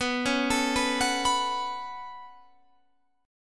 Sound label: B 7th Suspended 2nd, Guitar, Standard; B7sus2; arpeggiated; JGuitar